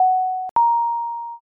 elevator

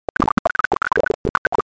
water boil

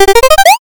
picoquestion

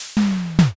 fridge

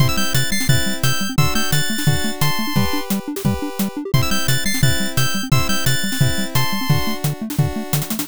bgm

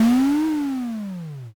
dragon

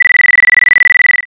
trainbell

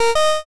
pico hello

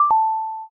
stop button

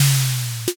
drawer

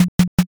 door bang

